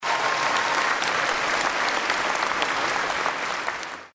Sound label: intro audience; without music